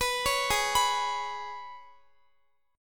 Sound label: Ab Minor Double Flat 5th down Guitar; arpeggiated; Abmbb5